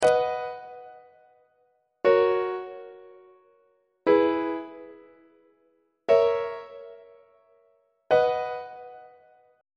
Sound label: b major leading tone iac on treble clef